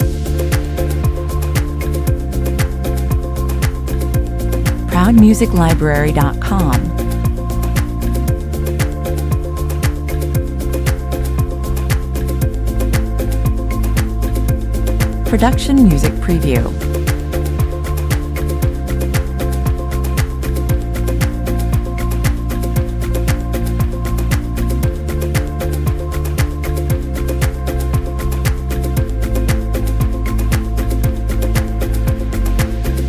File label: no guitar no piano